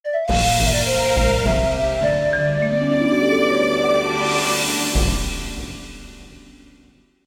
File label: free Spin Outro; wild turkey